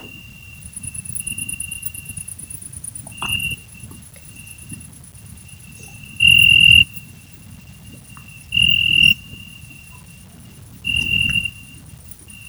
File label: beep beep; Wren, patch